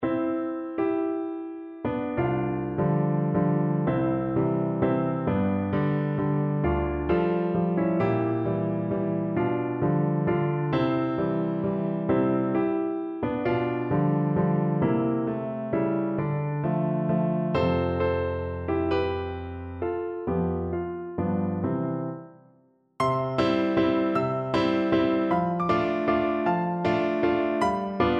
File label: waltz, piano; emperor